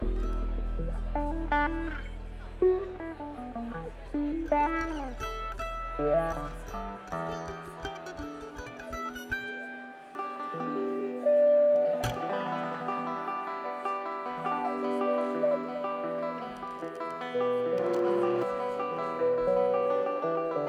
tuning

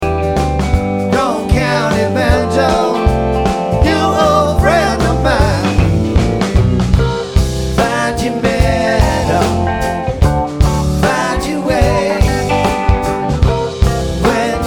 Banjo

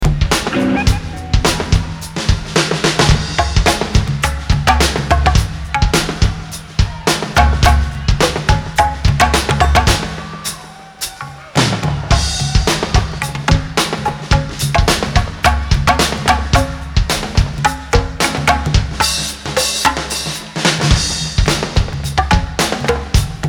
Drum Dub

